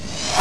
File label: Build zoom stereo; Fantasm 5 Demo; Anvil